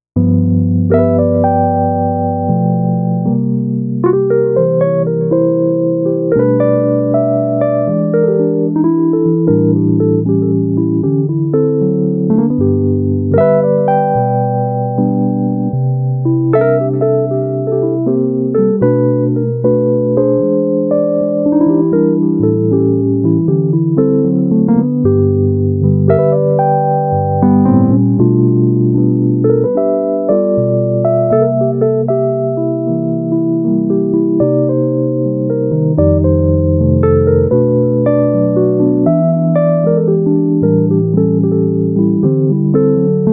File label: Wurlitzer Bluesy Chill 77